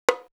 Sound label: Bongo; Perc One Shots; Coldcut's Kleptomania VOL1